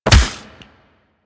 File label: Melee Fist Impact Robotic Flesh; Warframe; Weapons; Fists